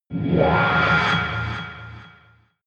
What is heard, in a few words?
alien metallic scream dark reverb; aliens